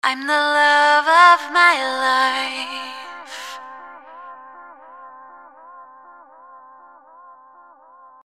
Vocals Reverse Vocal

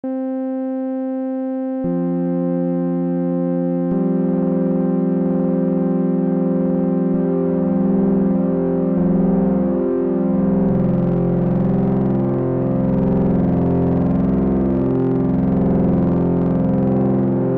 Drone C sus4; King Drone

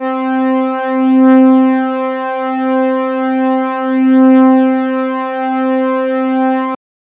Prophet loop

